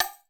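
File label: Far East, Alesis D4, percussion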